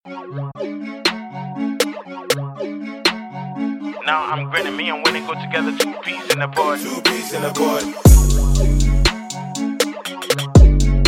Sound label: Beat Instrumental; Prod. MikeMillzOnEm